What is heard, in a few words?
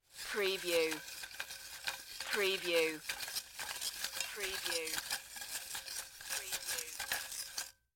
Paper Spinning in Metal Pot (Ticket Cage)